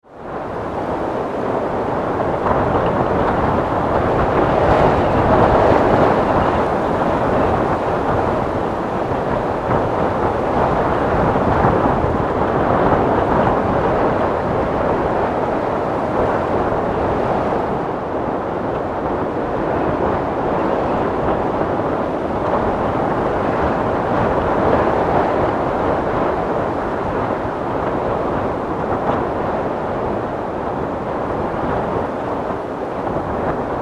Wind, Storm; 5.1

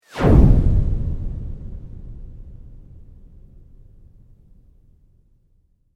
Sweep Medium; Created sweeps, swooshes and other movement effects; Sweepers (Sound Effects:Sound Design)

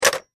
Technology Telephone Receiver Hang Up Slam Down

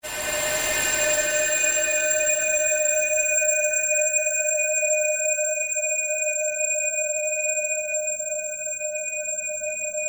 SURGE FADE SLOW; sound building blocks created through manipulation of raw material; Rise And Dive

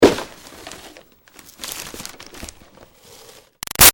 Foley Box Hit Xmas Present Moves; Crinkle; Objects; Big Room Sound

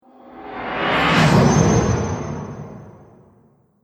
Trailer Magic Whoosh Sparkle; Twistedtracks

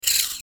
Rollover Digital Stutter Descend; Mouseover Tonal Soft; Multimedia; Blastwave

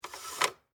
Slide Projector, Reel, Lock; Technology; Projectors; BlastWave

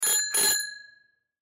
Bicycle Bell Ring, Bicycle Bell Ring Bike; Bicycles (Sound Effects:Vehicles); SPORTS; Blastwave

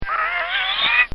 Cartoon Sound Effects Squeak; Cartoon Sound Design

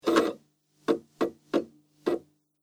Dvd Blu Ray Machine Turned On; Entertainment System Sound Effects; Andrew Fleming

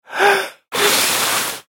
Person Breathe In And Blows Out; Breathing; Human; McKinney Sound

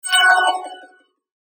Logo Bell Ring Flange Percussive Delay; Sonic Logo; Blastwave; Sonic Logos; Production Element Imaging Element Accent Transition

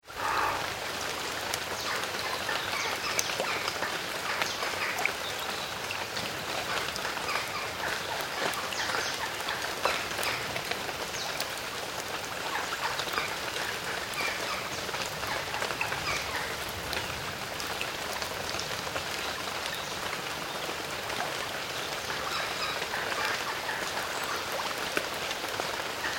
Woodland Birds; Country birds with rain on foliage - distant sheep; Animals

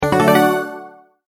multimedia tone advance

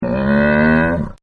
Camel Voice; Camels; Partners In Rhyme

